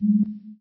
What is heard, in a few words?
General UI press; Rabcat; Classic 243